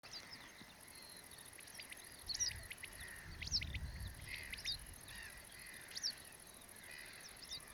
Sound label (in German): Wachtelruf Ochsenmoor Dümmer